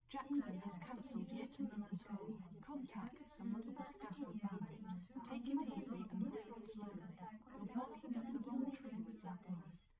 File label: female live rec 1m sim; SASSEC07